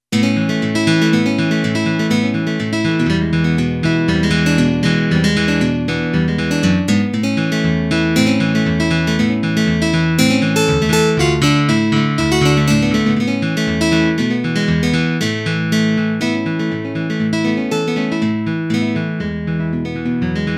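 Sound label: Acoustic Guitar song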